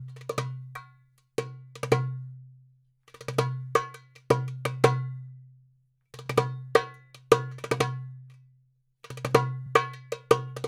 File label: darabuka loop, 80